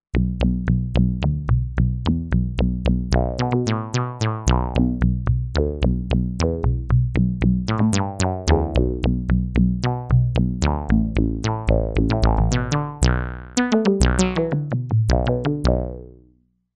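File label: Bass 3 Pole Reso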